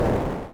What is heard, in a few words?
fast skydiving part 3 fadeout